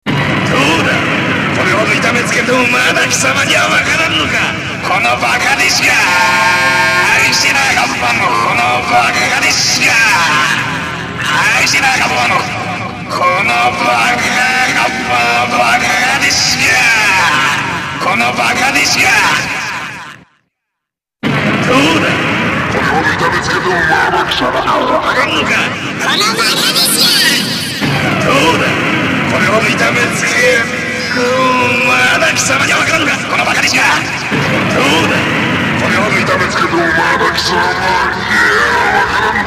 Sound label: v-synth